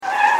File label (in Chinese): 刹车